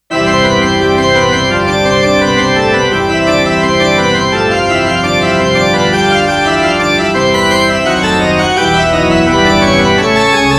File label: church org